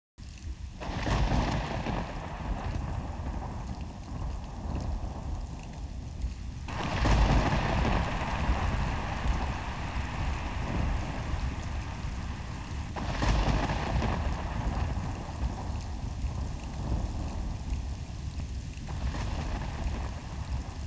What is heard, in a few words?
Thunderstorm